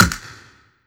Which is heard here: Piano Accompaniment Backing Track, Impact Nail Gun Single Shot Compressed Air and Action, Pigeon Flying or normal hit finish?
normal hit finish